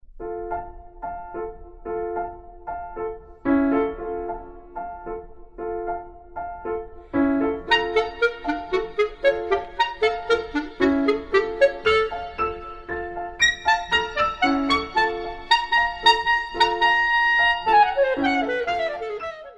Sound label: Songs Without Words E flat Clarinet